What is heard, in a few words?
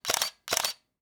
camera shutter click; mechanical